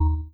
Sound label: Alert; Miasmata